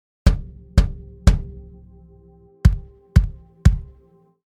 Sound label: filter-like Setting